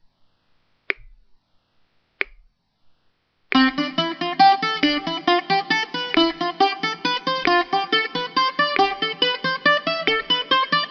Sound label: BOUZOUKI RECORDED EJERCISES SKILL; VANGELIS TRIGAS BOUZOUKI TEACHING METHOD; SKILL EXERCISES